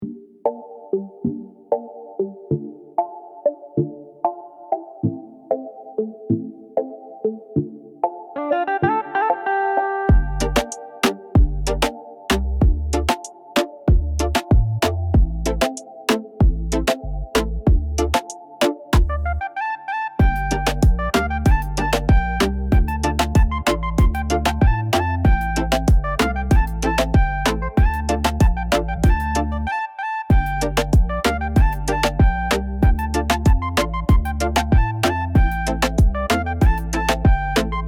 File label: Reggaeton beat